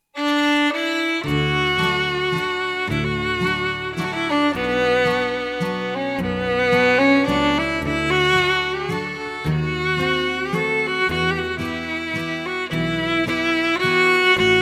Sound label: Gold and Silver Waltz, Texas Fiddle